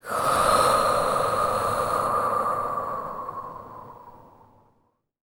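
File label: female breath; Spectrasonics; Vocal Planet; Jazz FX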